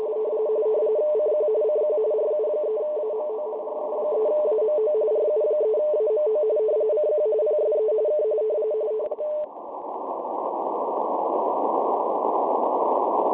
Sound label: qso rtty45 cq; ru3amo